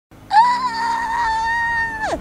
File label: Squealing